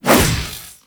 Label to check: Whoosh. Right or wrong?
Right